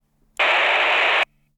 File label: Radio Static; HauntedBloodlines; SFX Producer